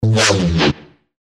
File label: Sound FX G